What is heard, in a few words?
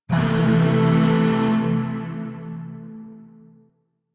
Shawzin Pent Maj Chord A; Music; Chords; Warframe; Zariman Void Shawzin; DE